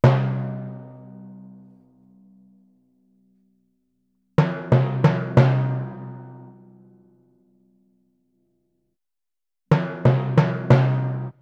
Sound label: timpani, Cmin; PowerFX - Downtown Orchestra; Orchestral arrangements